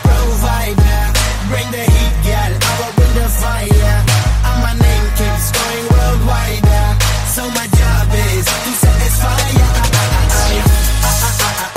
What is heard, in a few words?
cool remix sad music ringtone